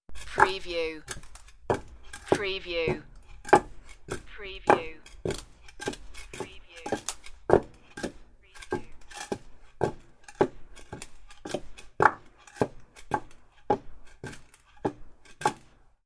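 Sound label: Clogs Concrete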